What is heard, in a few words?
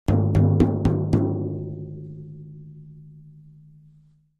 Drums Toms B Herald, drums tom